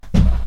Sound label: punch